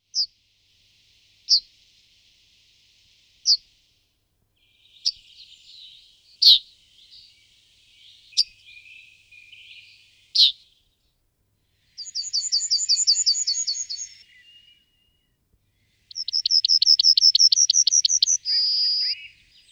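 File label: Emberiza citrinella, passeriformes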